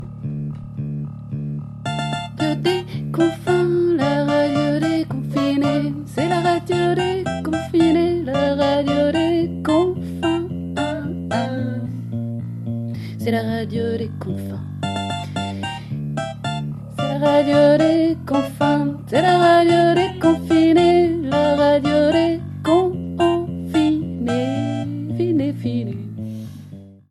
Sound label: jingle